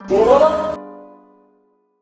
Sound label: harp noise; Gachitale